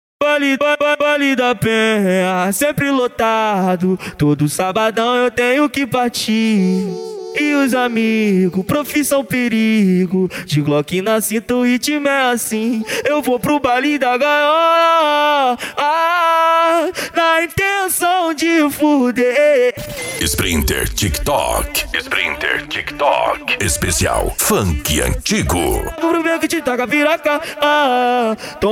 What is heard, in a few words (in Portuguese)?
esp funk antigo